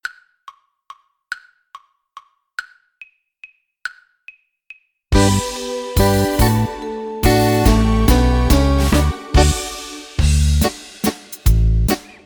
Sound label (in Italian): mazurka, base